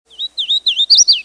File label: warbler